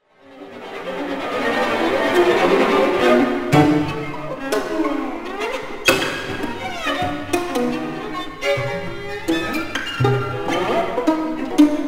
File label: string quartet